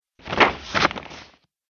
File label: War Map Paper Movement; Paper Sound Effects